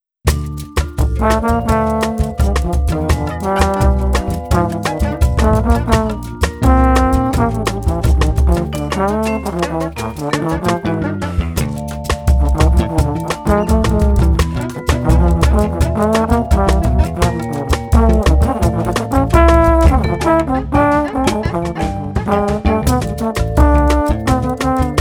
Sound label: trombone